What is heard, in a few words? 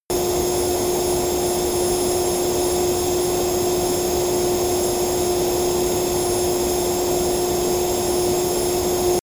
military jet fulcrum turbine full power direct